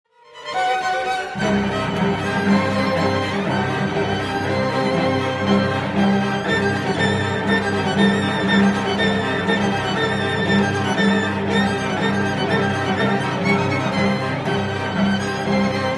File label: piano trio quote; Shosti Str Qt 8